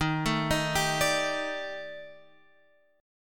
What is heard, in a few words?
D sharp Major 7th Flat 5th, down, chord